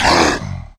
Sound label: pain; agrunt